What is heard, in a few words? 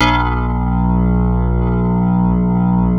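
bass C2; Greytsounds; Scott Peers Sound Engineering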